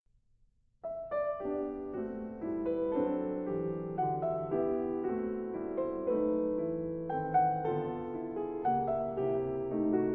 Quatuor piano

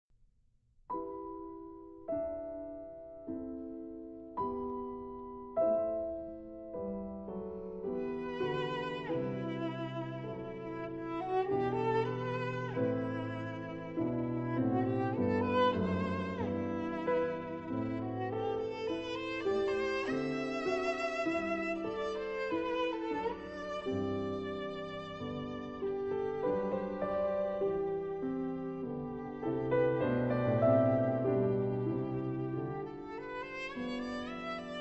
Soliloque alto piano

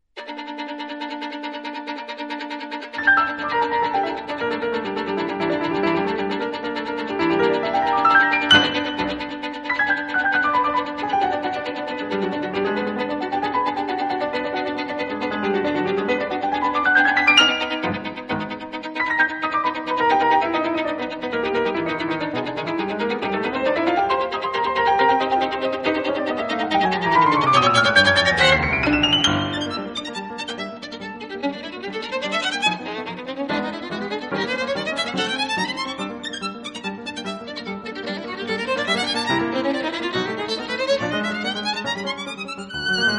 Sonate violon piano

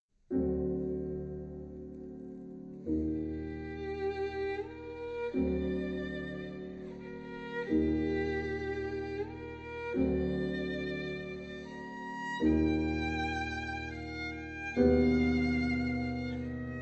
Nocturne violon piano

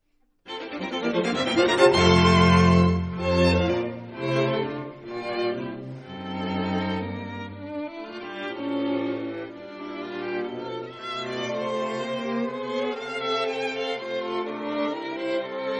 Quatuor

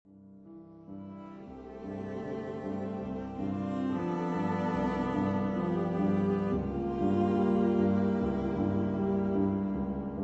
Quatuor piano; Paris : Heugel, 1946; 3. Andante

